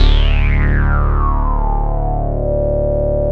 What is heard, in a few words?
LO SYNTH; E-MU Formula 4000 Series Vol. 2